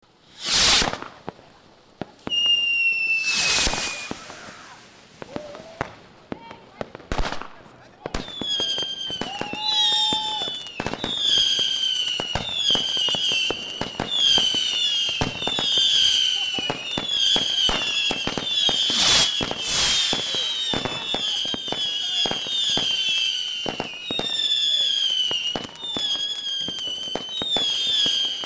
silvester fireworks close to two neighbor parties on street